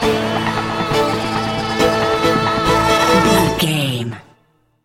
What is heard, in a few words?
dark showdown stinger